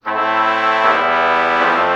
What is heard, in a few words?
oboe trumpet lpc true chord morph